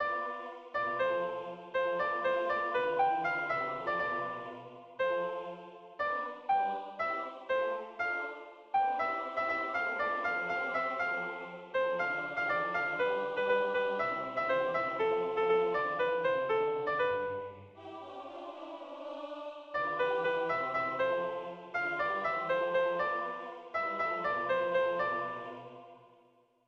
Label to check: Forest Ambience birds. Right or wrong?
Wrong